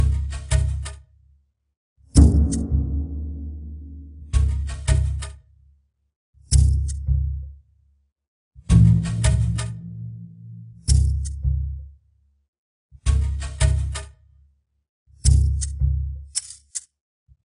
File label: Jungle Level; sim theme park theme park world 1999 pc gamerip